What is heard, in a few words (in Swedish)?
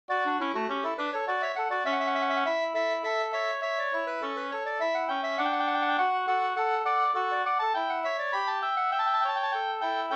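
slangpolska, two-part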